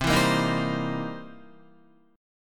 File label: C 7th Sharp 9th C Guitar; C7#9 strummed; JGuitar